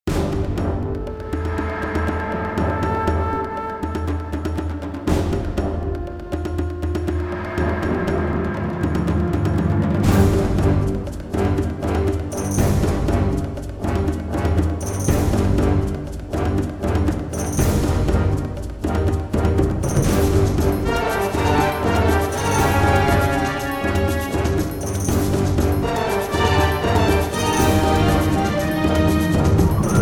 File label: Spooky Cave Combat